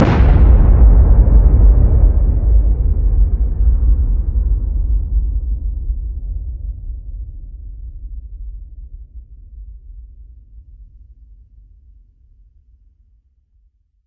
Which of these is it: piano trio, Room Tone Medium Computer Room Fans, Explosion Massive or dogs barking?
Explosion Massive